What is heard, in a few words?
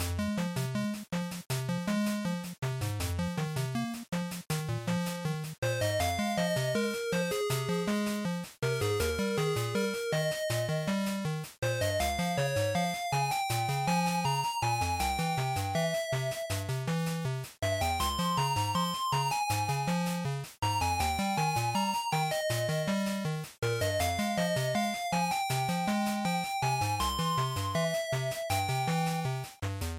Action Loop 80